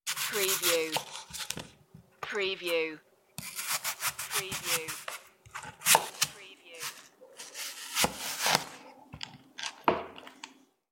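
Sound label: Slicing Apple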